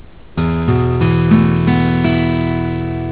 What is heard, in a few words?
chd esus, guitar